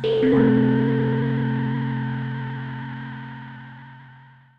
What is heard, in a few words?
Synth, Gasious Giantz Snuffel